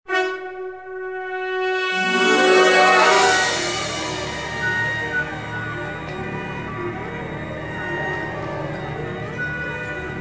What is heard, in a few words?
Death tune; Ultima